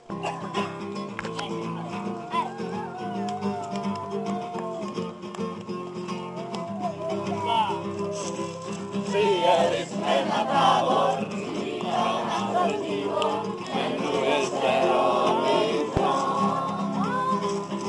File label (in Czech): Hymna